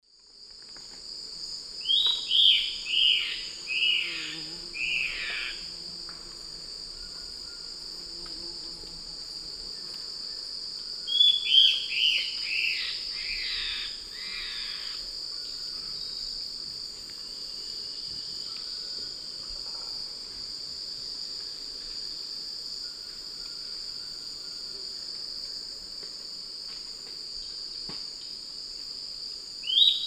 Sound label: Phlegopsis erythroptera, song; S. America; Eastern Lowlands